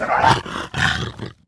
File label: Tiger Death; Playable Tutorial Level; TR3